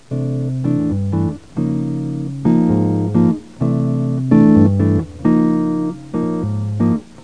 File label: J guitar; WIREPLAY